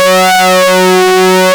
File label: SYNCSWEEP F#, ARP Synths; E-MU Formula 4000 Series Vol. 3; Analog Odyssey; ARP Synths II